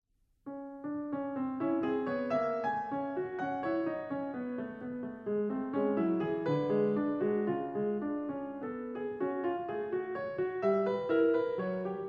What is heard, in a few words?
poliphonical piece